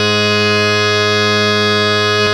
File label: HARMONIUM, World Instruments; Best Service ProSamples vol.52 - World Instruments 2; AKAI